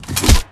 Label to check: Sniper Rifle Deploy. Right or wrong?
Right